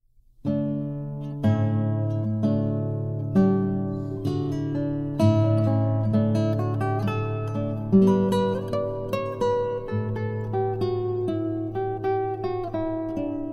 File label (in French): Quelques pas de danse Guitare